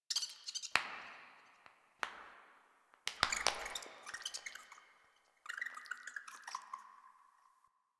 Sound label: Knuckles Idle Anim; Proto Frame Idle Anims; Lettie